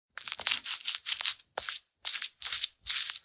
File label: Soft egg wash brushing; cooking